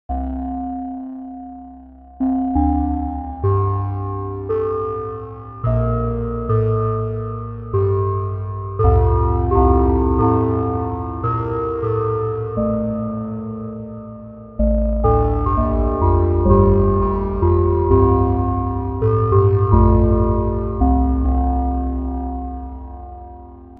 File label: Piano Temple; sage Island; Hylics